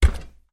foley footstep single barefoot on metal step ladder rung; zapsplat